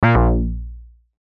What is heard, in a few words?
Synth Bump; Buttons; Multimedia; Blastwave